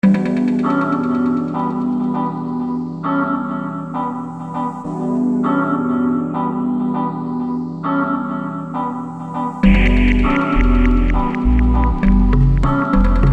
dub trio kit; samplescience

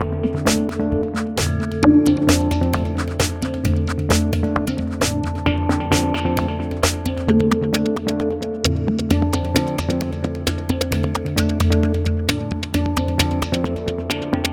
hang drum; soniccouture; mk2

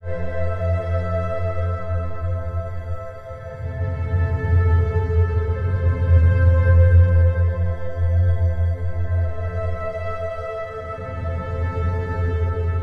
paulstretch stretched; default 8.04x stretch; Material with drums and percussion came out a bit hissy; my result sounded a bit spooky; Paul from ZynaddsubFX; The original sound and the stretched sound

